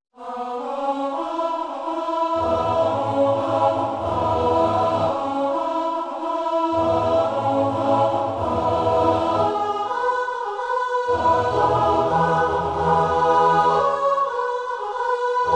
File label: SYNTH